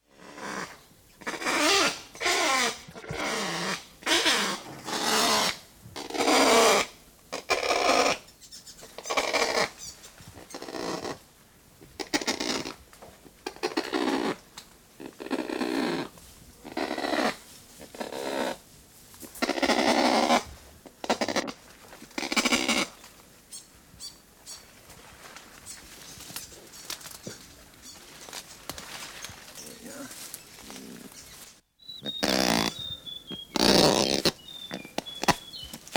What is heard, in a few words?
kakapo, bird song; native animals